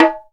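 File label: Ethnic Perc, drum; Percussion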